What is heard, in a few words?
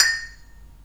anvil